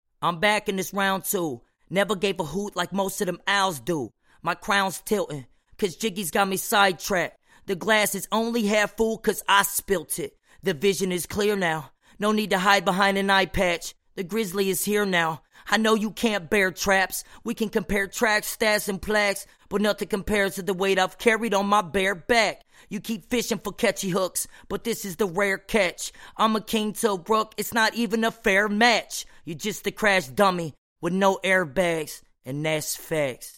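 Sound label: hip hop 60 Gm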